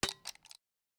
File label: Place Bell; Valley